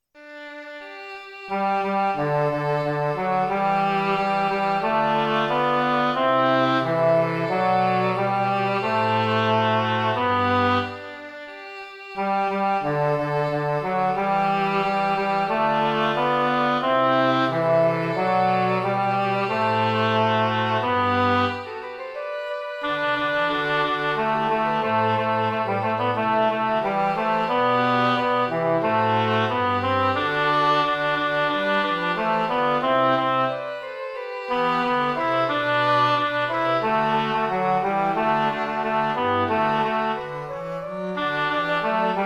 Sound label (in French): ténor; Veux-tu compère Grégoire